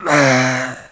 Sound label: death; mohaa; dialogue